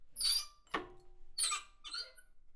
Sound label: Squeak, Workshops